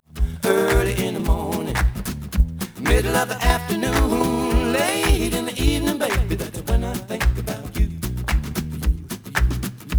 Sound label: noised; 3bit